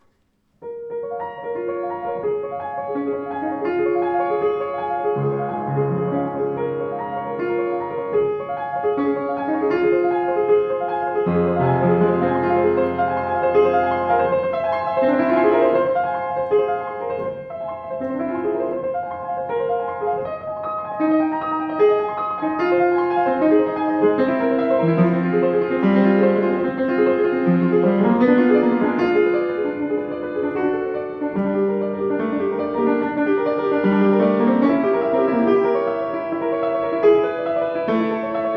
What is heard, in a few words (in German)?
Schimmel, klavier; 112 schimmel schwarz poliert